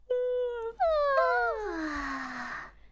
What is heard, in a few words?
double yawn